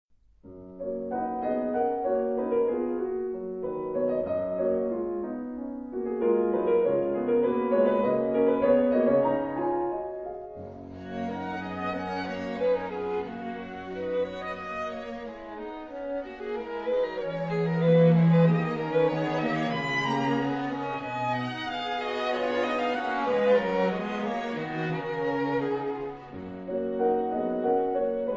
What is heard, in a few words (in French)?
Quintette